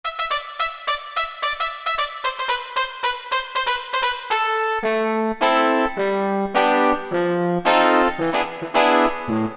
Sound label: BR Trmpt, Yamaha TG33; Factory Presets